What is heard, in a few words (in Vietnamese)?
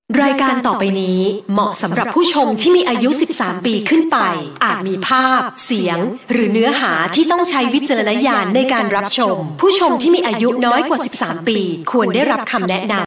nhac chuong bao thuc am anh kinh di